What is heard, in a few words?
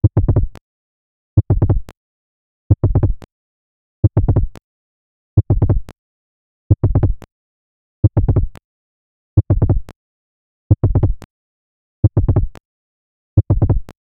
heavy heartbeat 90 beats